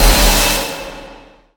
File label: crystal soul spear cast